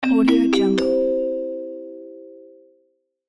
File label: announcement